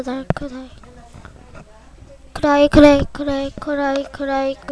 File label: cry